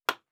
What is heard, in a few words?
Nail; Fantasy Sounds Bundle; Craft